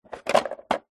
household coffee machine drip tray plastic movement; nespresso; zapsplat